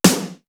AIRBURST; East West - Global Sounds; D4 BD SD TOM

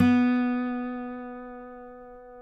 COLLINGS B 2, Guitars

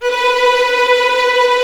B4 TREM, VLNS TREMOLO, String; East West; The Ultimate String Collection

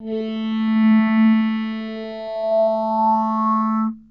Guitar Textures

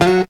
JAZZ NOTE, Funky GtrLicks; E-MU Formula 4000 Series Vol. 1; Hip Hop Nation

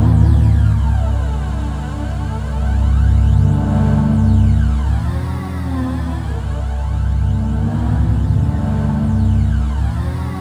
C2, Synth Leads

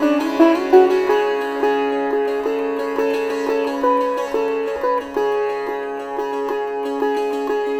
SITAR; Spectrasonics; Heart of Asia

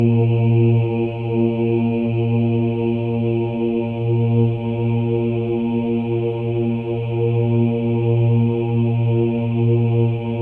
OHS, Symphony of Voices; Spectrasonics

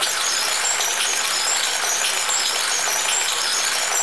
DELIRIUM, NIGHTMARE; AKAI Anime Sound FX

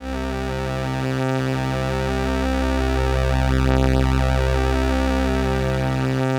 LAMBDA, String Machines

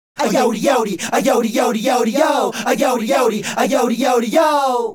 Male Group, Hip-Hop and RnB Vocals

